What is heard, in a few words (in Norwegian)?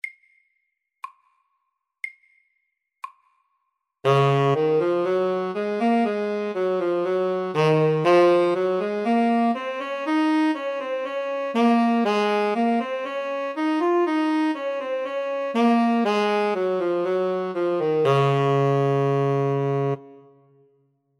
Etyde SAKTE